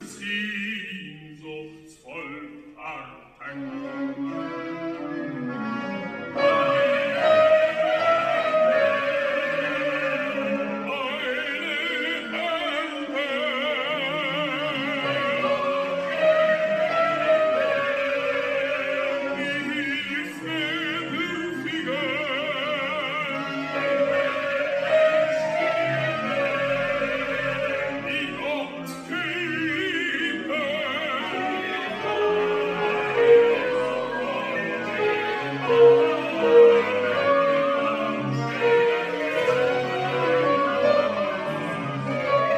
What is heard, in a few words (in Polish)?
soprany; cardillac